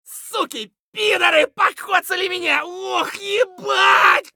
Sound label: scav wound; eft